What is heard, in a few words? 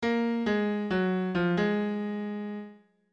melody